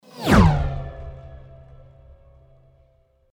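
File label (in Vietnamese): Tiếng bay tốc độ cao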